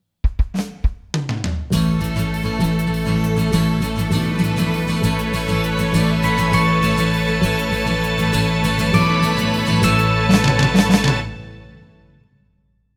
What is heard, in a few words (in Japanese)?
OP ジングル